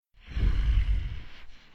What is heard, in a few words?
guardian alive; metrocraft; customnpcs